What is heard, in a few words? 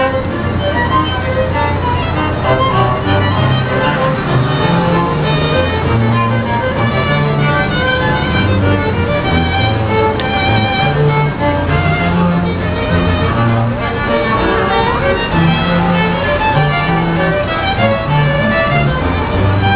sisters playing violins; United States; Illinois